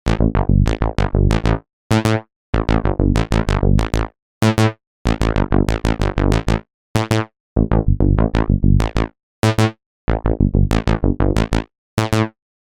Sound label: Velo Saw; Cherry Audio Mercury-4